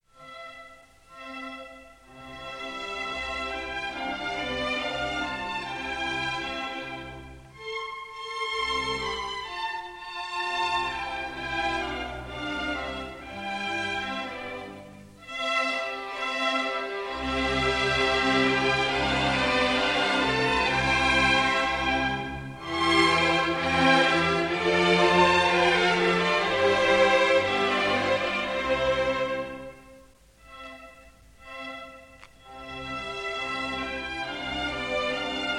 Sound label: Serenade in G Major, Allegro